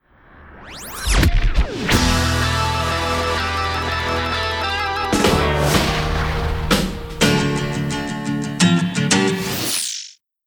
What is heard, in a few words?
rock bumper hi